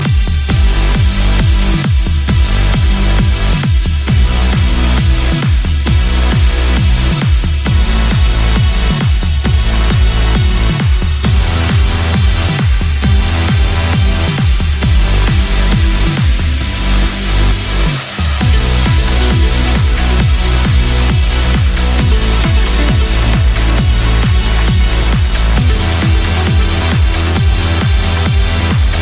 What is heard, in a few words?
Boooom Bassss